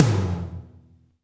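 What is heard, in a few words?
tom, real mega drums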